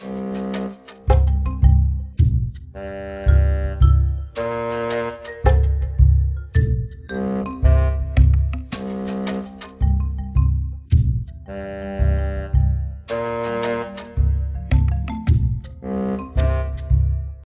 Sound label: Jungle Level